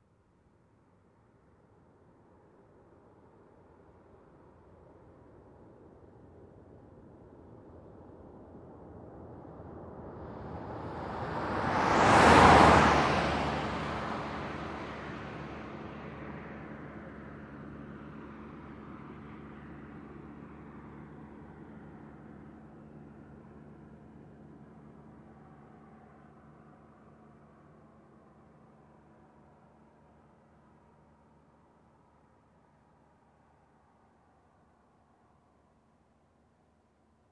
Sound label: Car driving by fast